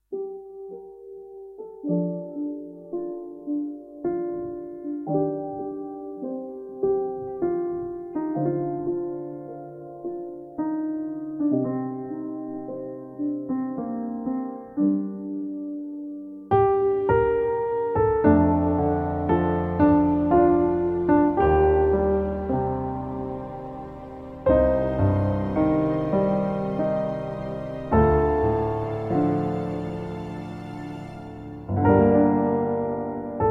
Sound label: Classical New Age Piano Music